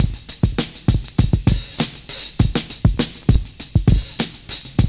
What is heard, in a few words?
JAZZY, loop; Univers Sons - Kitch 70s